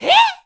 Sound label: Gasp; Niko gasping; zelda sounds